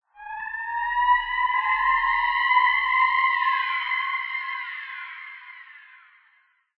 sound design weird burst swell sci fi warped alarm; zapsplat